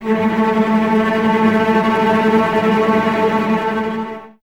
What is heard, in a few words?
cellos A 3, String Ensembles, Tremolo; Miroslav Vitous